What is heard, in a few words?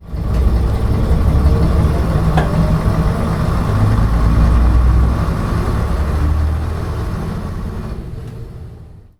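aec routemaster, bus; Simutrans; Pak128.Britain-Ex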